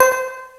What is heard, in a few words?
Electric Piano, Akai MPC-60